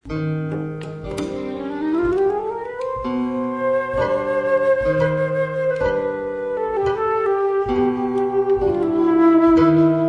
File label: flute waltz